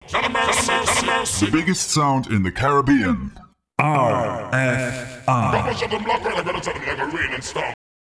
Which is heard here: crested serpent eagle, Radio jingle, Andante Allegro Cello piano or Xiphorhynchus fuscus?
Radio jingle